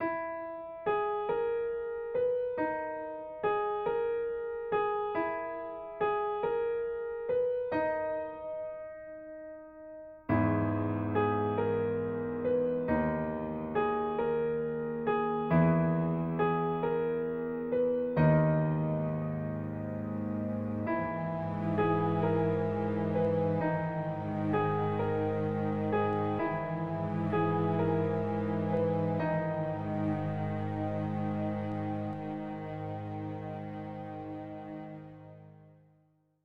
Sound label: Gloomy End